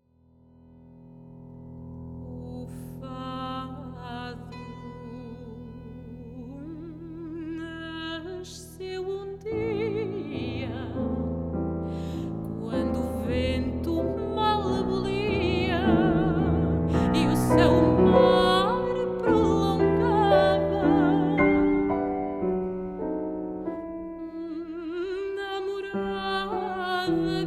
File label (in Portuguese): Fado Portugues